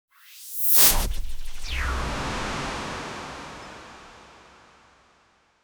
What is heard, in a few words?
quick cinematic, whoosh